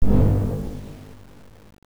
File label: ks drum